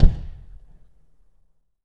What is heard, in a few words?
LO BOOM; 3D Sound - 3D Keys VOL-1; MEAN 3D